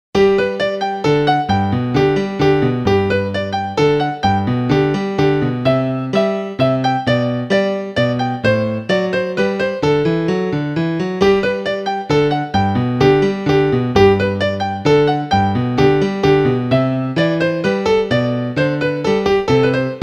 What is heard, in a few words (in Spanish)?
piano musical minueto